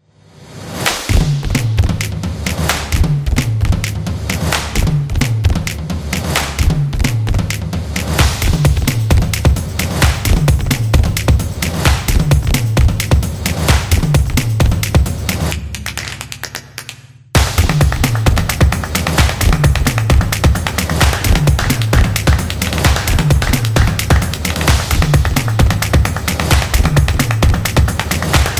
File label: The Rhythm, battle drums